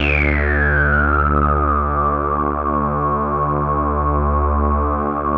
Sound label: SPLIT SWP D3